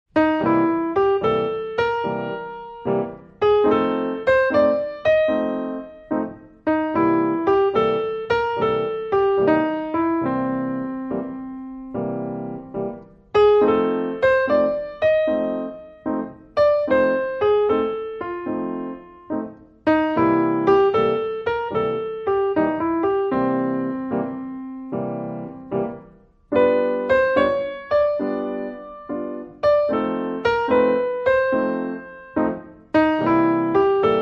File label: C Twelve Bar Blues